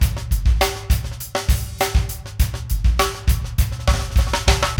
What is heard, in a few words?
funky drums; AMG; Now CD-ROM (Roland); NOW from HELL!